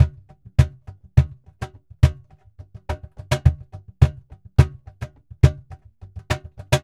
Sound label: prc 210, Flamenco, Percussion, Seguidilla